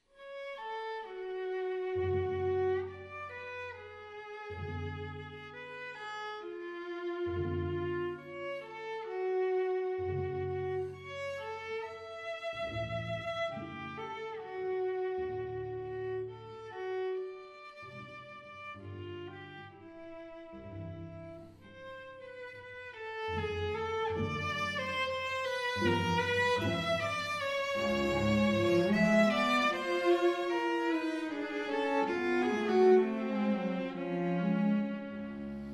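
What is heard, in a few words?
string trio; rontgen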